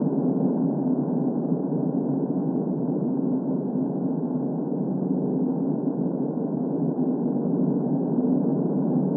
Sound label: interior; Counter-Strike Global Offensive